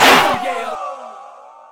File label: Chants, Vox